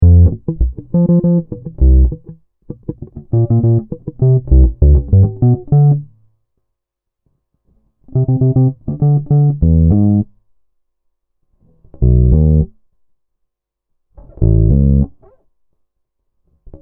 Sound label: Congolese rhumba, bass loops